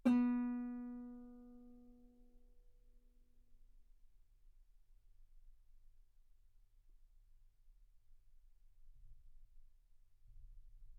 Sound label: Guitar ff B3; sul B